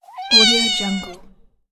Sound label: kitten meow